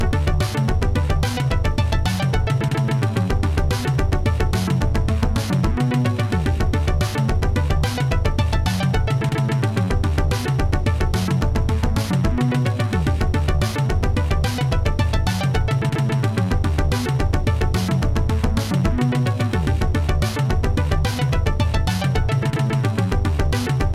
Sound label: Pre-Launch, pinball; Black Rose; Bally; Ship Sink Ready